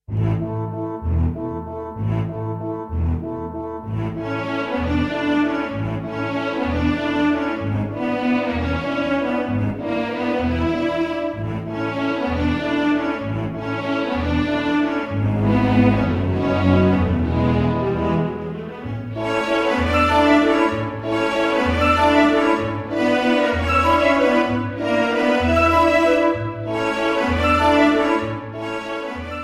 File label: Classical Medley